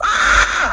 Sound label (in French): cri